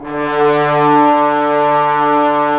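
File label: brass trombones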